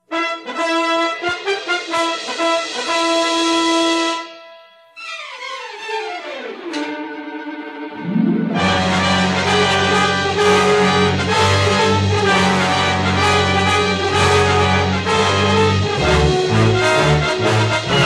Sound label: Chase medium